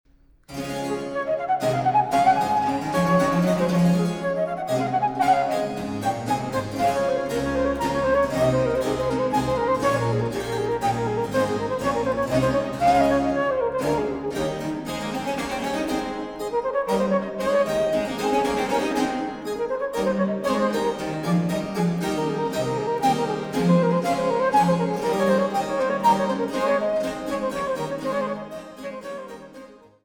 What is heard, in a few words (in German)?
Solo 2, D-Dur für Flauto traverso & B. c.